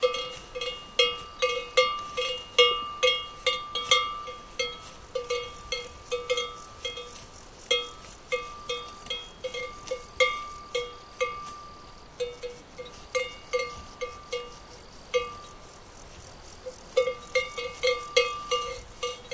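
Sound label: Cow Cowbell Movement Wind; cows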